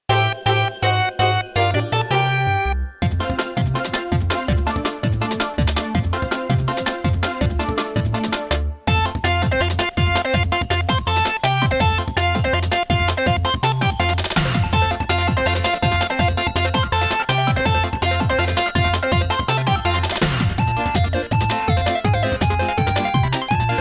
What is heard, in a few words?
Bonus Game BGM Arrangement